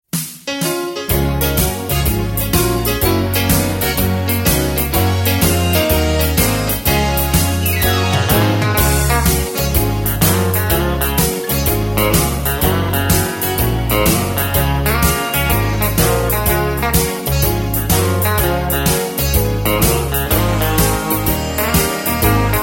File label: harmony